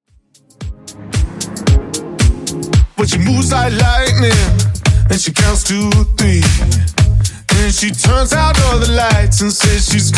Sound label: Top40 DJ Friendly Redrum 113 bpm